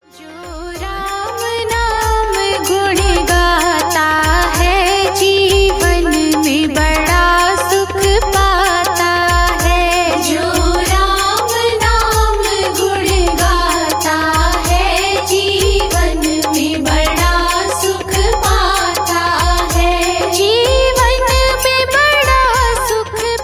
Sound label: Ringtone, Aarti